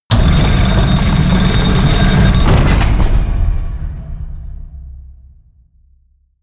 portcullis close

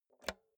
insert xlr multi socket hard; objekte; elektronik

